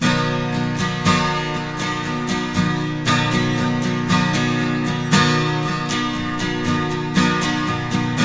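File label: 12 string major C, Guitars; Spectrasonics; Hans Zimmer Guitars VOL-1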